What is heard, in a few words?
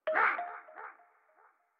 Mech Aggro; StyxMech